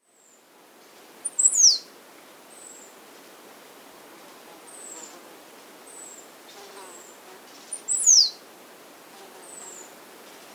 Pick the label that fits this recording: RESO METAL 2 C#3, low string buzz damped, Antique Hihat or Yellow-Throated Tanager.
Yellow-Throated Tanager